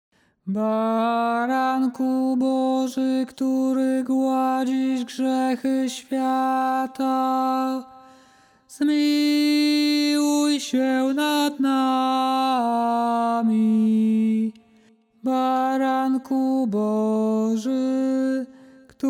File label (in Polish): Baranku Boży messe tenor